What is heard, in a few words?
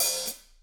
HH, Drums; Bob Clearmountain Drums I